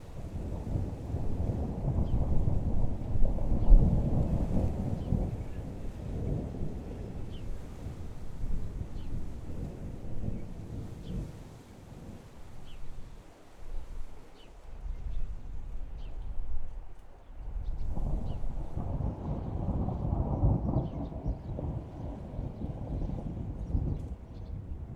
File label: Thunderstorm calm dry ambience; Dizzy Duck; Sounds From Nature